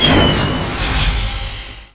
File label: warp exit